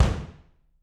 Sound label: BD, Drums; Bob Clearmountain Drums I